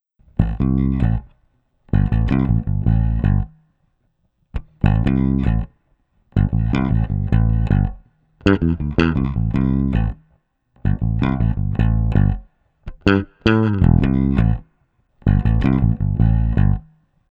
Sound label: Passive Split Coils Bass Track; Aguilar; king koa